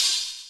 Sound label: Cymbal, Hip Hop, 092, Dmin